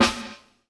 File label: HIT VERB, PROC SNRS, Drumworks; Sampleheads; New York City Drumworks VOL-1